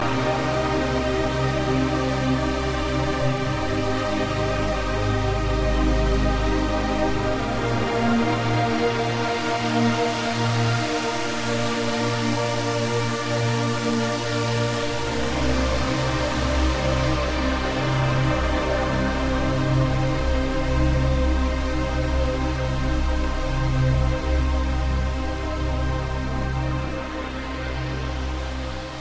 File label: Trance